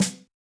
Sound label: Yamaha DJX 2B, Snare H Soft